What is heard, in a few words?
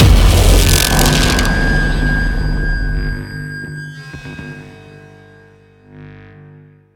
Cue End